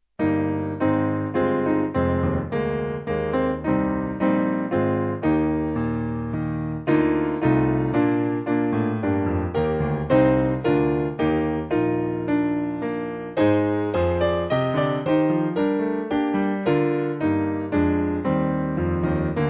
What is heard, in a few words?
Slane Piano; Angels And Ministers